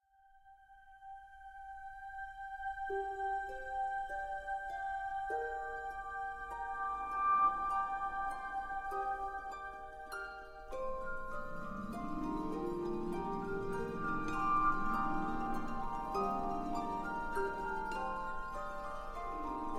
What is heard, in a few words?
bg music